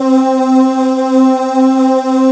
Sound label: SMOOTH VOX C3, SMOOTH VOICES; Best Service Alpha Dance vol.2